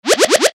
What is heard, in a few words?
Laser, Synth; synth shots tech house; Shock; revealed